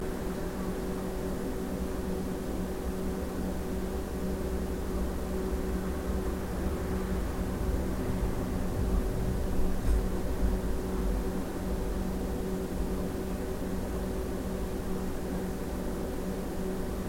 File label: bump; evps